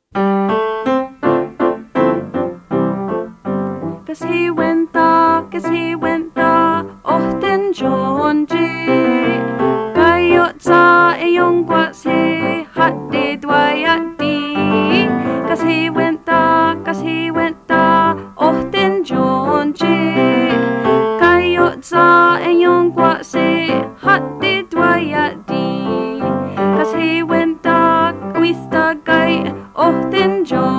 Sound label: Jingle Bells